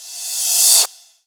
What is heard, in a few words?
Reverse Cymbal